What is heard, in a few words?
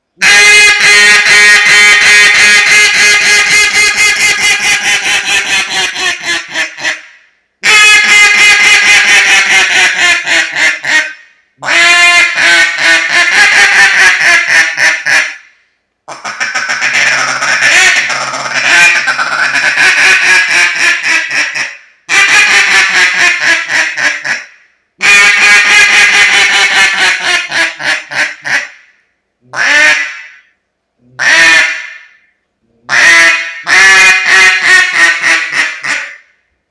Timber Arkansas style Duck